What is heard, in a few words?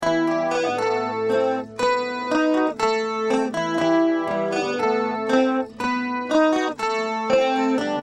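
acoustic guitar Rockman stereo chorus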